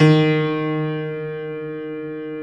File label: D 2 PNO; Keith Emerson Signature Series